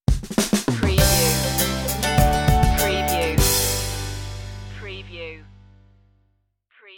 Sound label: family tree stinger